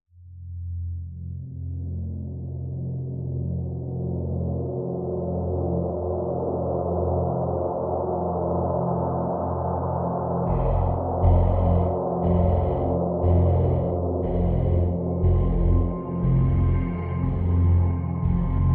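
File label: Drone Wind Farm F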